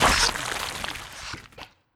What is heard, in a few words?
Big Death Gore